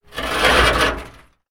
zvuki bochki

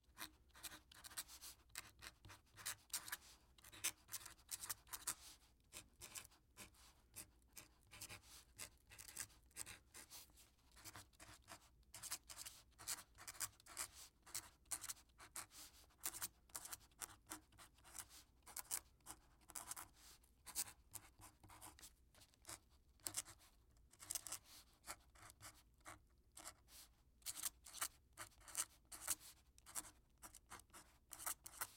zvuki perevoi ruchki i chernilnitsy

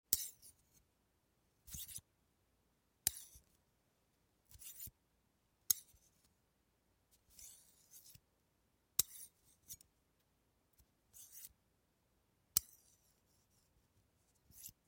zvuki viazaniia

